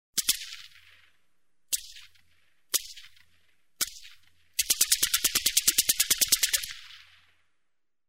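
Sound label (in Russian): zvuk ocheredi avtomata kalashnikova, Kalashnikov rifle burst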